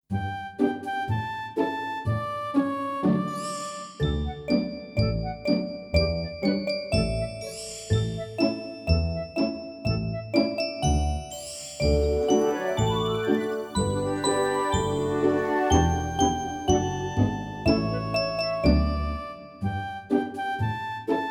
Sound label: Melody